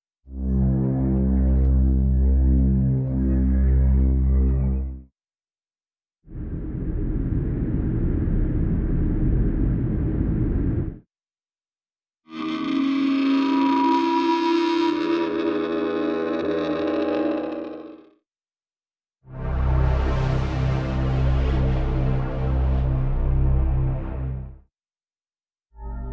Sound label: Drones Pads; SmartSoundFX